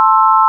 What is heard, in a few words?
sine